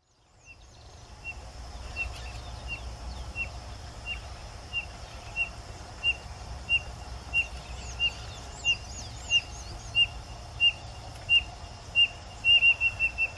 Canto da Codorna Mineira

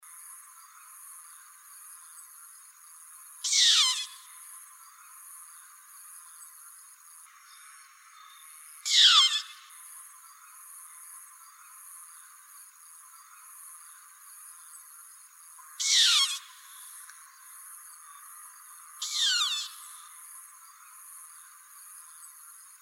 Canto do mocho dos banhados

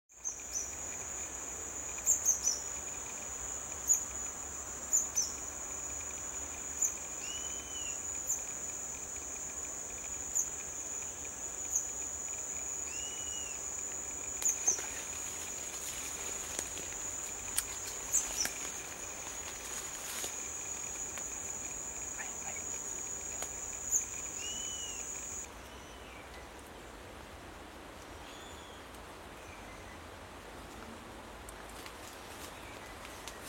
canto do balanca rabo de garganta preta

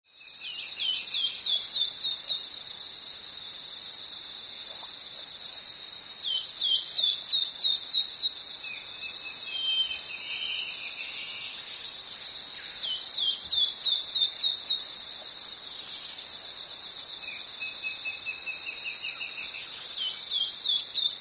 canto da choquinha de asa comprida

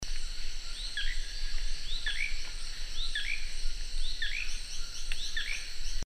canto do uirapuru de chapeu azul

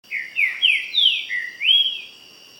TRINCA FERRO

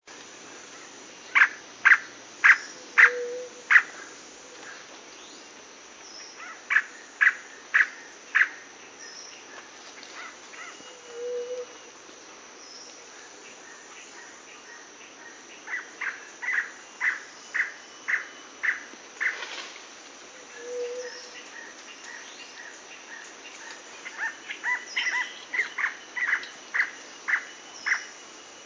canto do chororo do rio branco

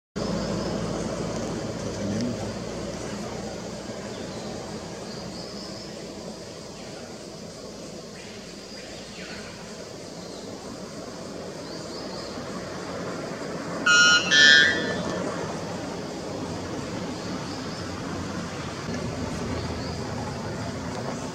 canto da araponga da amazonia

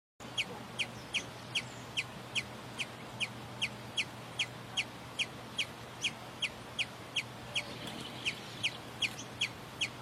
Canto do beija flor cinza

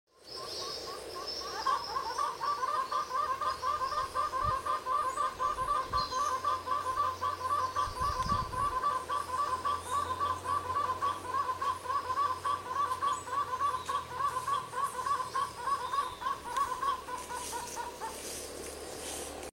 canto do prong billed barbet

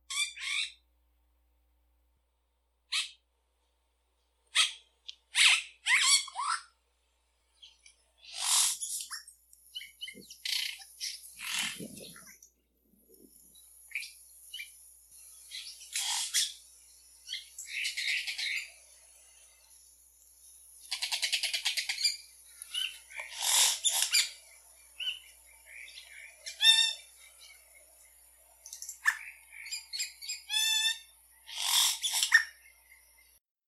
Canto Xexéu

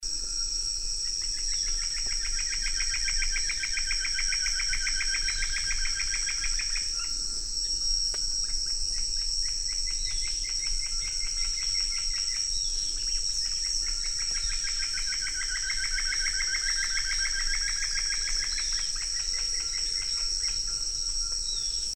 canto do arapacu marrom

